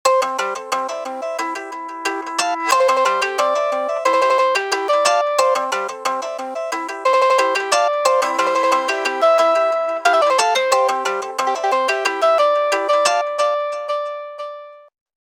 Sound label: Guitar Chops, ringtones; Motorola Backflip